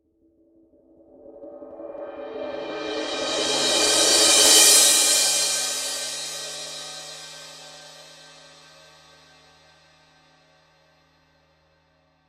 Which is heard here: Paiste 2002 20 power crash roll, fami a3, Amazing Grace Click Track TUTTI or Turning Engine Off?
Paiste 2002 20 power crash roll